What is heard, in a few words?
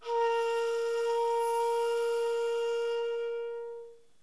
Ha No Meri, shakuhachi; flute